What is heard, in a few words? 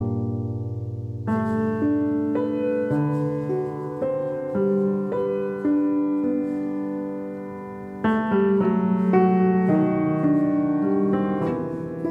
Solo Piano